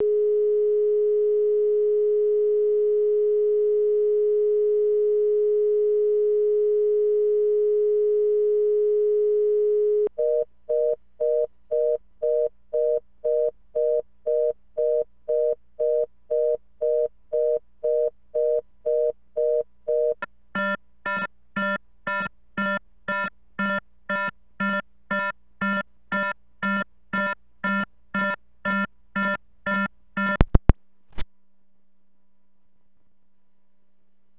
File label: disconnect tone